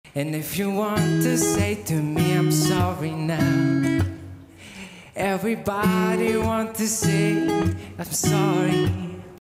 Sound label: Lithuanian Singing